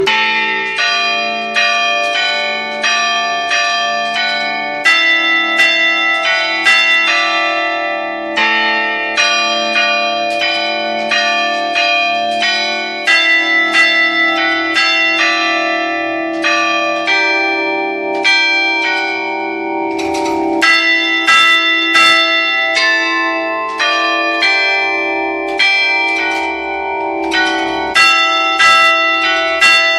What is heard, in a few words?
Church Bells; Ave Maria